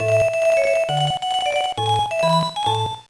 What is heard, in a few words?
Jingle; Doki Doki Cooking Series 2: Gourmet Kitchen Suteki na Obentou; gba